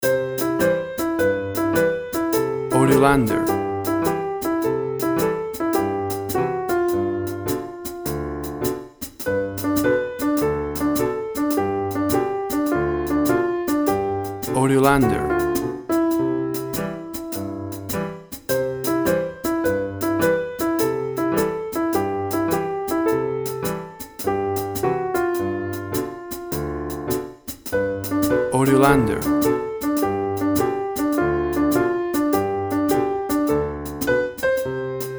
Soft shoe, Piano, clarinet, and drumkit play a tune in the old so; Piano, clarinet, and drumkit play a tune in the old softshoe tradition.